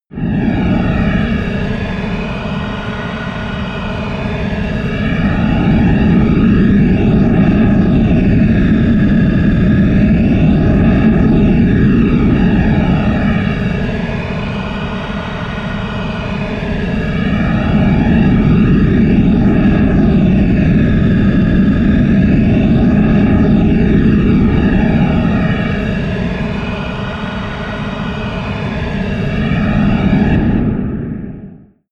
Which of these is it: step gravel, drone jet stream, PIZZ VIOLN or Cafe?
drone jet stream